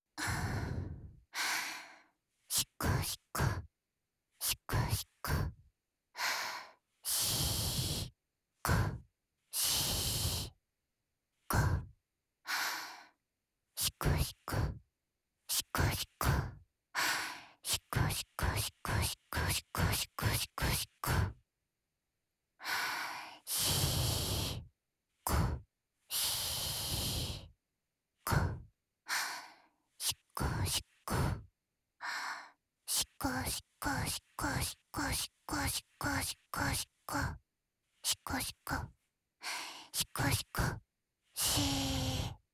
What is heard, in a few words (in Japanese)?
手コキ（しこしこ・右）（SEあり）手交